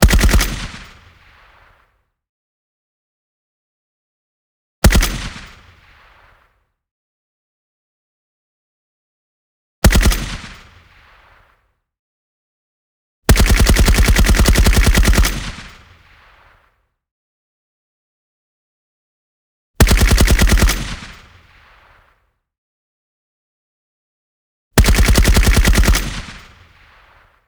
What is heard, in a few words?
Machinegun M1919 Auto-Burst Shot X6, Weapons of World War II, Designed; Super Thump